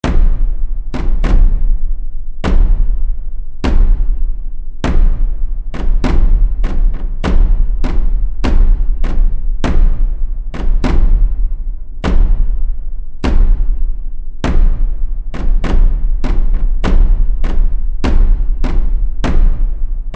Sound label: Drums Of The Undead, scary soundscape